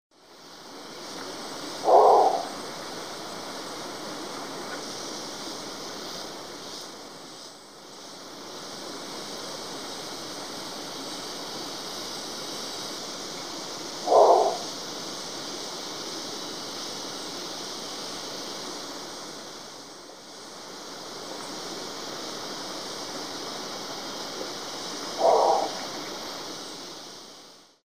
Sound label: Long-wattled-umbrellabird, پرنده چتر مرغ غبغب دراز